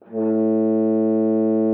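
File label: French horn A3; Xsample VOL-3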